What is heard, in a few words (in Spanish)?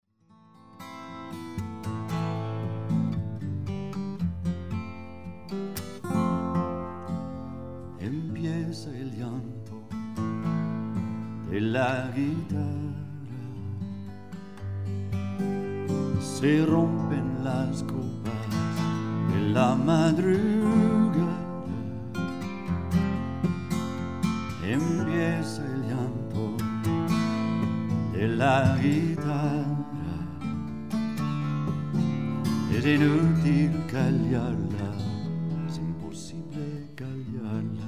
La Guitarra